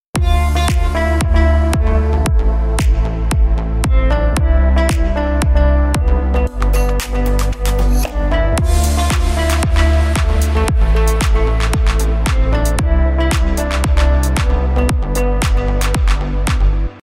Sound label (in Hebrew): רינגטונן לטלפון; אתגר צלצול לטלפון שאתם יוצרים